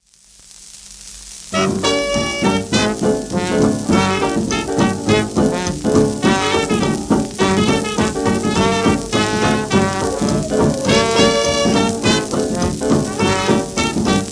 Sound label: bell